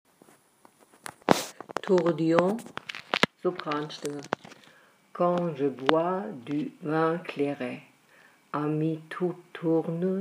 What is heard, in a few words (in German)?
Tourdions Sopran; singen